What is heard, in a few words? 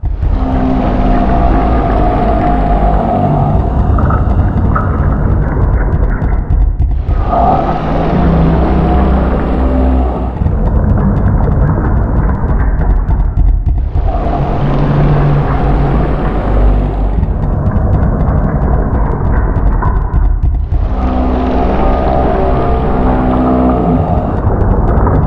Breathing Loop; City of Villains soundtrack; SeedOfHamidon Breathing Loop